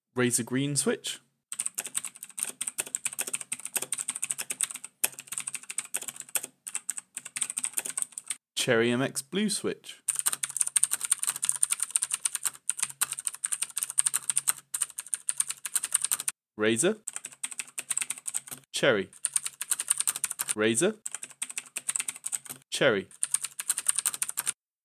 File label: switches